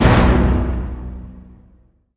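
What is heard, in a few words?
Hit Orchestra Tutti B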